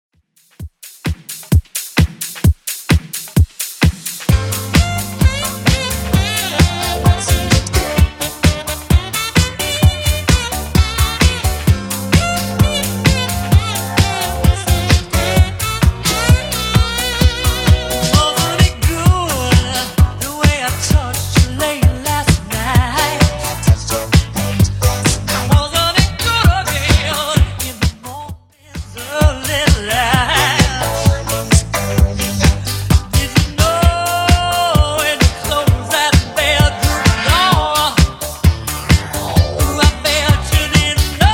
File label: 70s Redrum 130 bpm